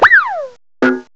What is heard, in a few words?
WHIZZ; EFFECTS; M8; CMI Factory